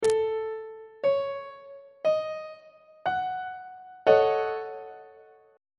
f sharp minor 6th 1st inversion on treble clef

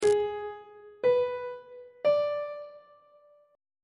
f sharp aeolian chord 2 is g sharp diminished; on treble clef

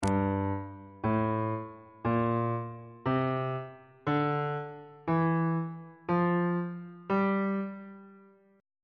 g dorian mode; note positions on bass clef

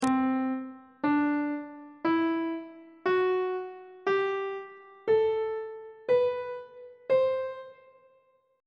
c lydian mode; on treble clef

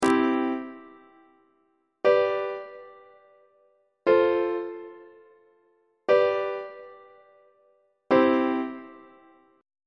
c major perfect authentic cadence on treble clef

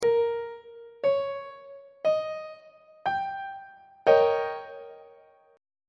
d sharp dominant 7th 2nd inversion on treble clef

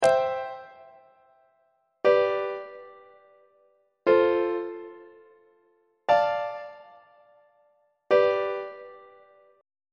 b sharp major half cadence on treble clef

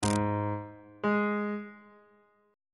b triple flat note

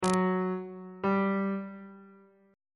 f double sharp 8th intervals on bass clef

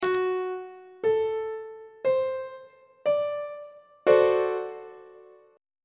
d dominant 7th 1st inversion on treble clef

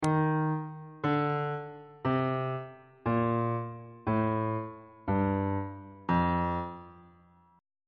e sharp mixolydian mode descending on bass clef

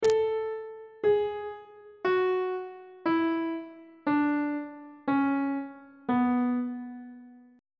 c flat dorian mode descending on treble clef

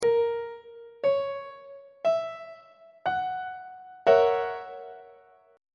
g flat dominant 7th 1st inversion on treble clef

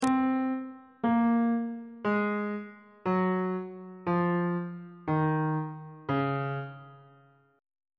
c sharp ionian mode descending; bass clef

